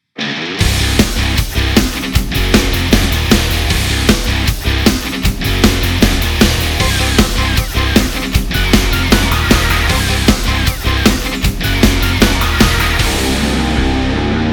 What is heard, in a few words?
angry